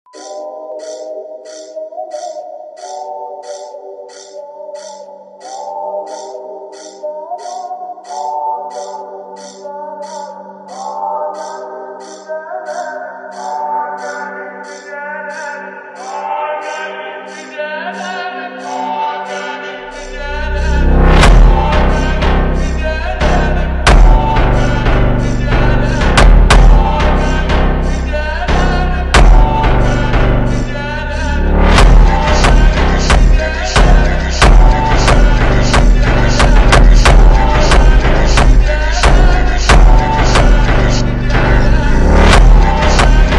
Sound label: funk over slowed